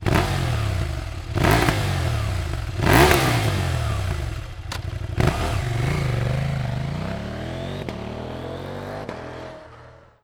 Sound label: REMUS BLACK HAWK, KTM 790 ADV; EURO4